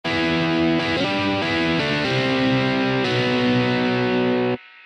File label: Guitar Electric Rock